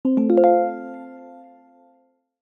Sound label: ARRIVED; WAYMO